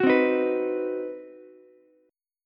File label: C Minor Major 7th Flat 5th chord, Ukulele; strummed; JGuitar